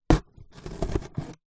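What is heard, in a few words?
foley cardboard box knife stab into twist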